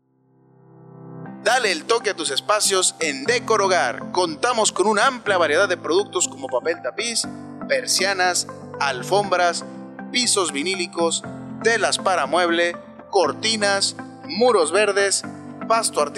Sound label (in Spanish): jingle generico; Decor Hogar